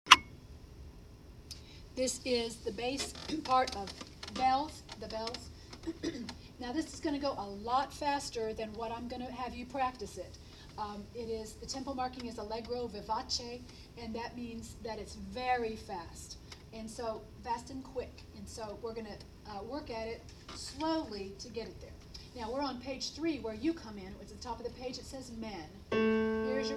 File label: The Bells BASS